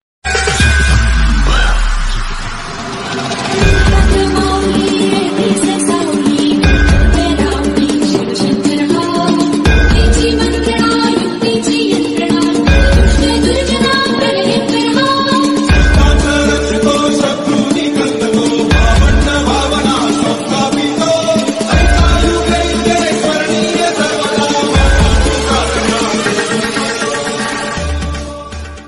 मरठ रगटन new marathi ringtone marathi ringing tone; शवज महरज